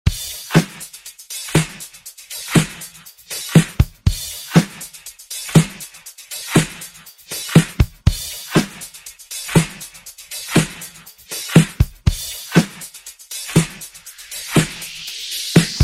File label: Funk 120Bpm